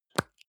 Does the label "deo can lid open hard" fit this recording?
Yes